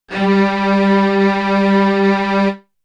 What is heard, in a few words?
Strings; ZERO G - Datafile DISC 3